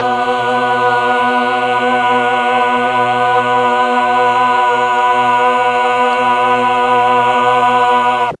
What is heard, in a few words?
B1 8 voice choir, mellotron